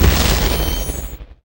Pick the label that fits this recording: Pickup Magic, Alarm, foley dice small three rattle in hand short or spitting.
Pickup Magic